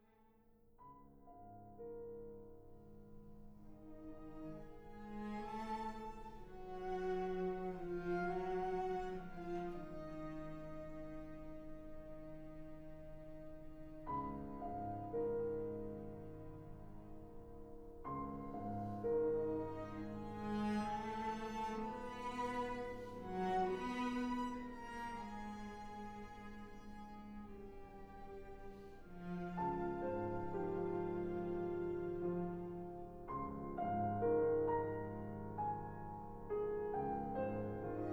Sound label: piano quartet excerpt